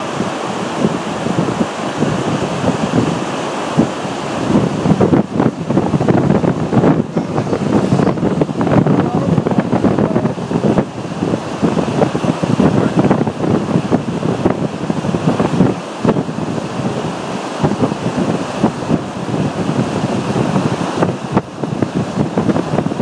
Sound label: waterfall